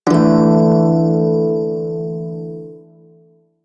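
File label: Prime Shawzin Pentatonic Major Chord E; Music; Warframe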